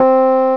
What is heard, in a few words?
Rhodes (C3); Flying Nightmares Demo; Flight Sims